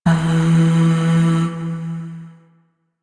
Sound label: Shawzin Oct One E; Warframe; Zariman